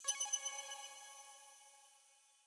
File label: logo instant bling